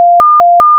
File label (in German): Anruf Von Sensor